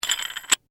Handle Screw Turn, Handle, Screw, Turn, Lock, Metal; Foley; Hand Tools (Sound Effects:Tools)